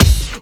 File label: BD, Dance Mega Drums, HIP